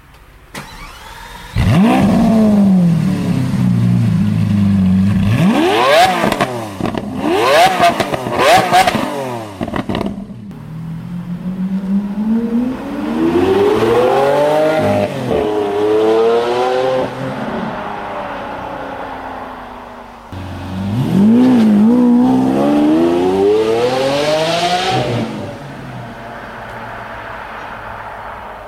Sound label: aventador, carsound; HomeControl